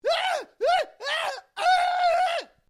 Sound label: MALE SCREAM PANICED; Male Vocal sounds; Screaming